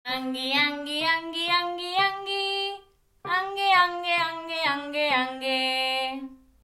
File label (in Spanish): twang nasal; Paraguas